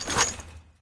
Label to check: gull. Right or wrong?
Wrong